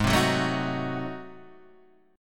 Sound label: Ab 7th Flat 9th Guitar Standard, chord; Ab7b9 strummed; JGuitar